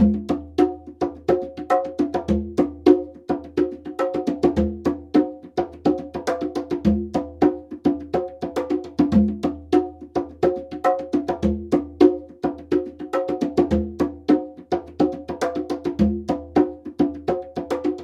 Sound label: conga 105 bpm; konga